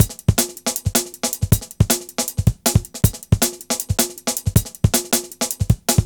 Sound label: Flying 158, Drum Loops, Jungle; Jungle Warfare 1&2